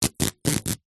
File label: office sellotape rip